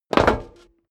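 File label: step dress boots metal dry jump, footsteps; schritte; menschen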